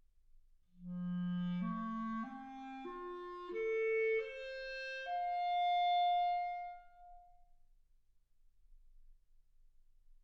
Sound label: clarinet